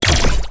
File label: energy gun